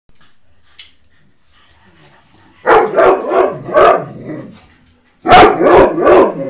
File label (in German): hundefreude